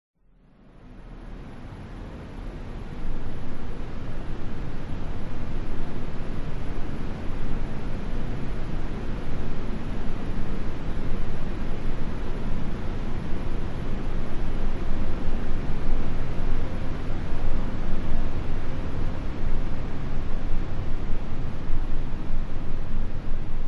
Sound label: sound design drone airy subtle scary; zapsplat